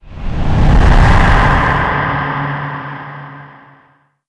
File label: fear; csgo; wcs go